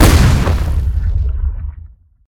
Seismic Blast Fist; Evolve; Behemoth

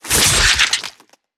Spider Trap Activate Spit; Lurker; Evolve; Gorgon

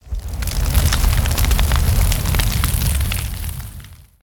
FlameBreath Swt; Evolve; Meteor Goliath

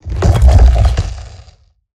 Roll Surface End; Evolve; Behemoth

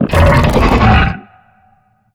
Mortar Tell; Evolve; Behemoth

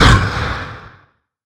hurt; Necromancy

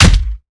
Melee Impact Base; Evolve; Behemoth

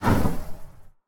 Burning Hands Whoosh; Evolve; Meteor Goliath

